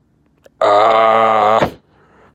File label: guahahaaaa